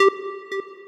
normal hit whistle; osu!

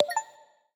device added; steam

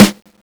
soft hitclap; osu!

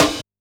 DnB Breakbeat Hit; osu!

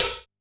Two Step B Hit Hat; osu!

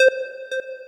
normal slider slide; osu!

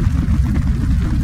Skid Dirt; LFS

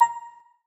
audio volume change; steam

